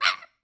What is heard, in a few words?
Bird Green Collision; fowl